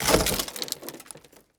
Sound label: Impact on Windshield with crackles and light debris; Fullmix; Red Libraries; The Windshield